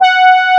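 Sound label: horn G5, synthesizer analog horn; AKAI S6000 CD-ROM - Volume 1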